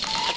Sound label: aliens